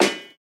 Metal Hit; SoundsCrate

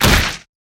body impact wood

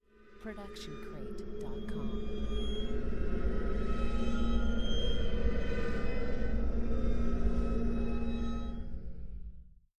deserted playground

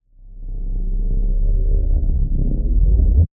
Low Machine Charge Up; SoundsCrate

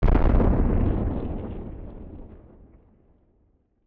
Nuclear Explosion Distorted; SoundsCrate

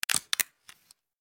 camera clicks

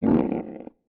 bear

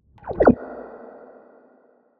water swell; soundscrate

